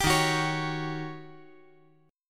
D sharp 7th Sharp 9th D sharp; strummed; D#7#9; JGuitar

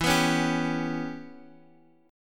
E Minor 6th E; Em6 strummed; JGuitar

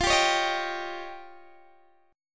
F 7th Suspended 2nd Sharp 5th F Guitar Open D

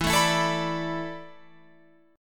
E 7th Suspended 4th E down Guitar Open F; strummed

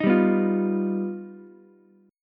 G Augmented 7th, Ukulele; G+7 strummed; JGuitar

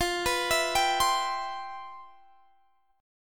C Major 7th F; arpeggiated; Cmaj7/F

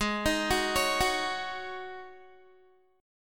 Ab 7th Suspended 4th Ab down Guitar; Ab7sus4 arpeggiated

